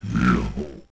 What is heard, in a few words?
Iron Knuckle Alive